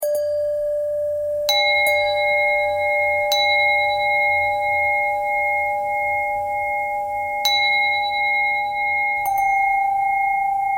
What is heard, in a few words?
bell thread pentatonic